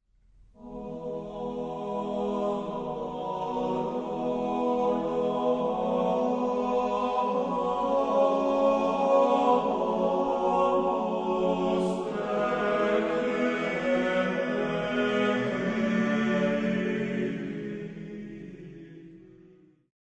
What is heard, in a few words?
Adoramus Te Christe, boys choir; Sounds Colours; Adoramus te, Christe, O. di Laso; Sofia Boys' Choir